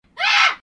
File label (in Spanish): grito